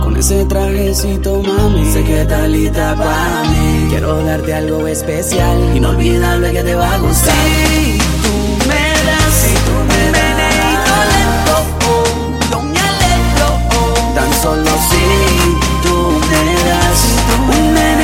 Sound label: Ringtone for Sony Mobile; ringtones